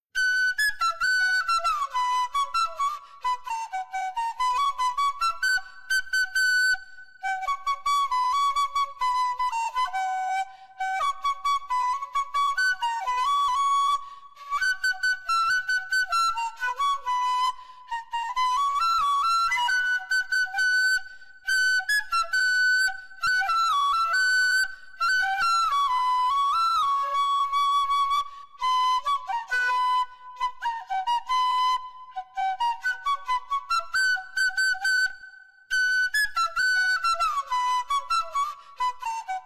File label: Bamboo flute